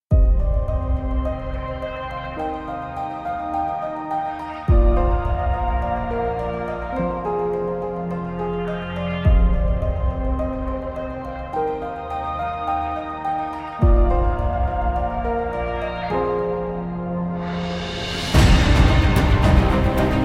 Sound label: bgm